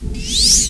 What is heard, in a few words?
Magic; Net Power 2000 September; Majesty; OnlineGame